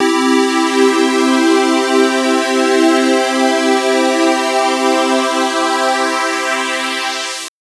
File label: Synth PulseSweepPad maj c3; TrashLibrary